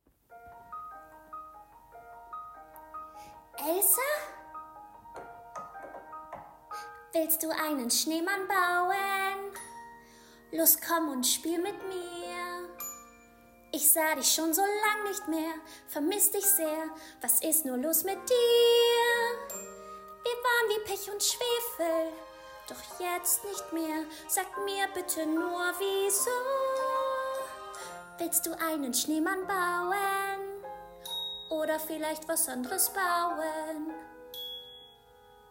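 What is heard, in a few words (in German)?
Kindlicher Gesang, Children's Voice, German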